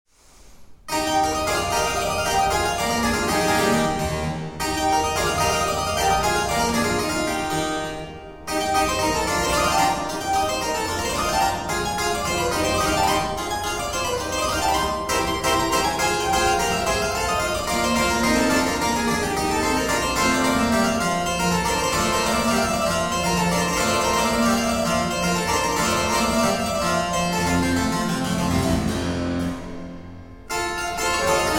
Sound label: Keyboard Sonata in F Major (Allegro)